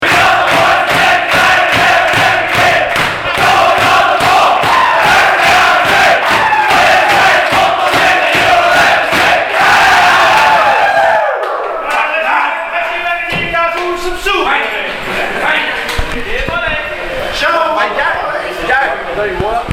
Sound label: sing victory song; knights; 2011 nswrl